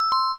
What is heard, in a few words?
Camcoder Stop, Camera; LG GS290 Cookie Fresh